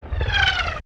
Cannonball Incoming; Period Combat; Sony Pictures Sound Effects Series

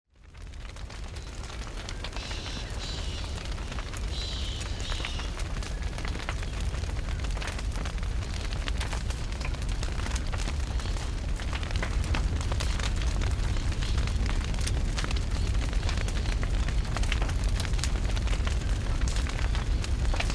Ambient

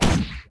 bow hit; RYL

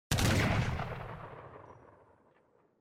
Muskets; Age of Empires III; cinematics

